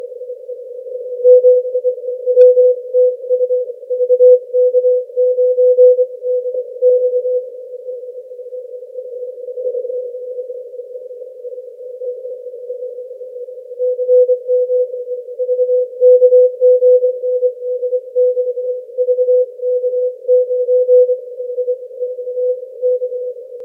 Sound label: 80m LP